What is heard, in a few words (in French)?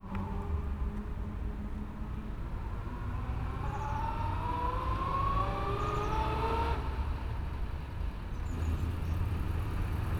BINAURAL EXT PASSAGE VITESSE